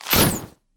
Web Shot; Lurker; Evolve; Gorgon

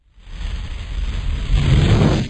fireball powerup

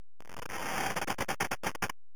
anomaly spawn; Necromancy

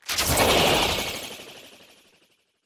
Sniff; Evolve; Wraith

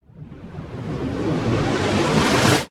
Spirit Walk Charge Up; Lurker; Evolve; Gorgon

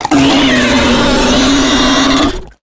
Acid Spit; Lurker; Evolve; Gorgon

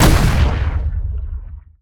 Seismic Blast Fist; Evolve; Behemoth

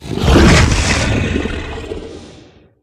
Sniff, Behemoth; Evolve

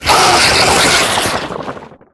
Harpooned; Evolve; Wraith

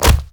Collisions Bodyfall Soft; Lurker; Evolve; Gorgon

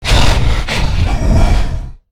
Charge Attack Vox; Evolve; Meteor Goliath

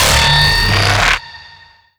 strider alert; Strider Remake

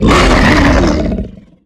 Stealth Attk Grapple; Evolve; Behemoth

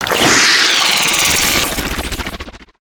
Acid Barrage Shot; Lurker; Evolve; Gorgon

